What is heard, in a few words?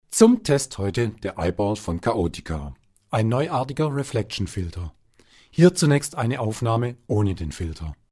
Vox Proz; Kaotica